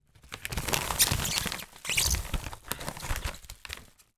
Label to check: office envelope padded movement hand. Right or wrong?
Right